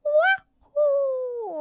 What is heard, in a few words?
Wahoo; noises